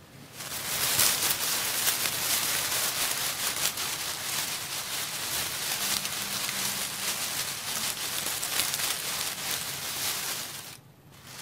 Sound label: leaf rustling; simple messages